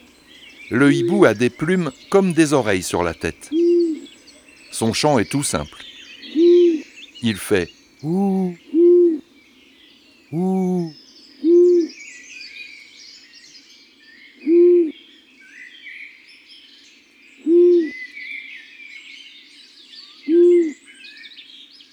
Imagier Hibou moyen duc, Un jour dans la foret

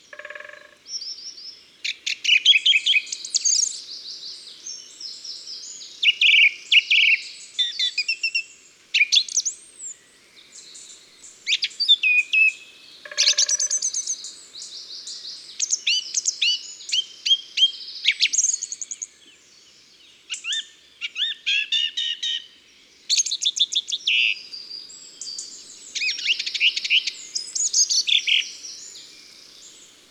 Pic epeiche tambourinage Chants d'oiseaux dans la campagne

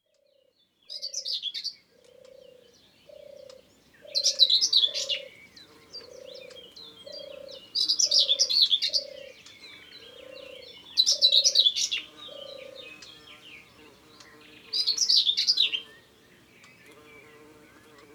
Fauvette Grisette; Ardeche; Concerts Naturels De l'Ardeche

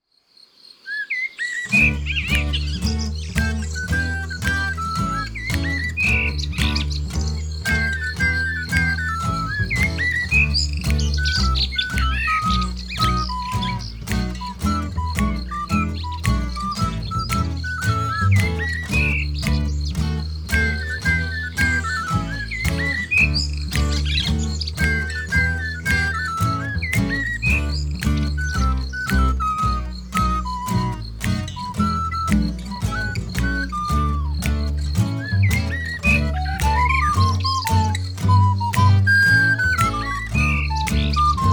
instru; Cuicui et compagnie

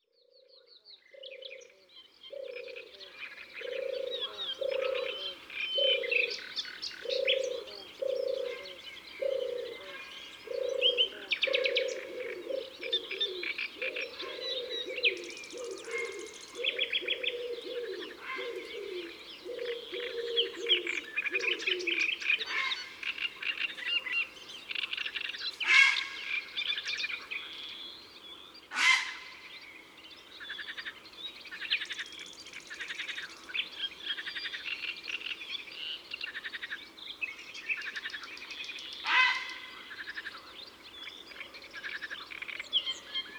Ambiance de l etange heron cendre; Drome; Concerts Naturels de la Drome; Studio les Trois Becs

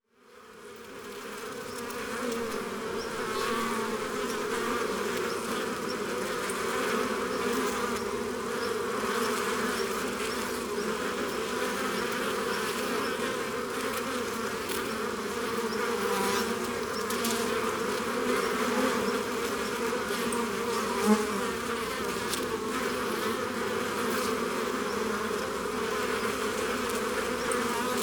Devant la ruche; Jaujac; Ardeche; Ambiances Sonores d'Ardeche; Studio Les Trois Becs

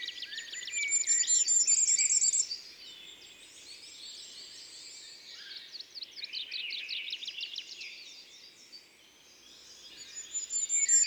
Roitelet triple bandeau, Concerts naturels de l'Isère; Studio Les Trois Becs

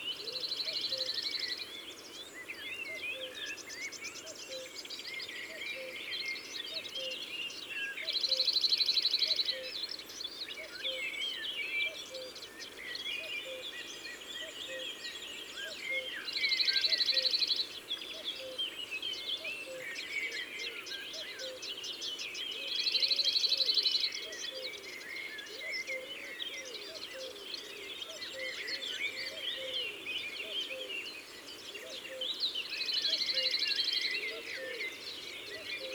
Bruant zizi, chants d oiseaux; ABC des chants d'oiseaux

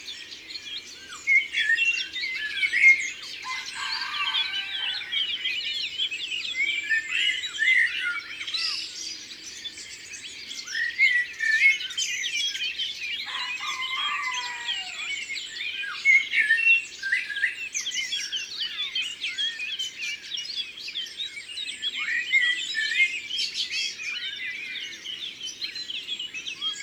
Merle noir; Concerts Naturels de la Drome; Studio les Trois Becs

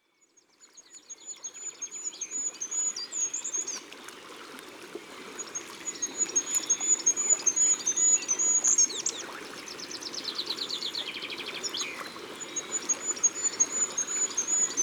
Roitelet Huppe, Concerts Naturels De l'Ardeche

